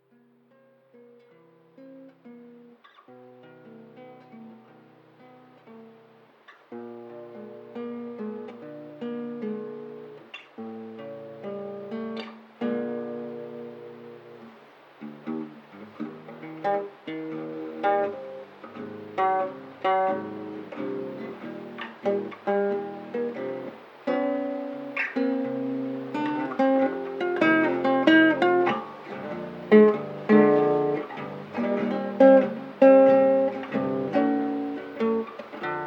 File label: instrumental filler